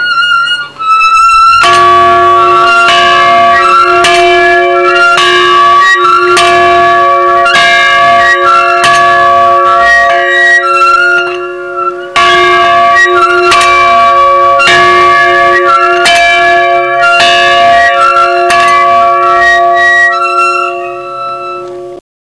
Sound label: bell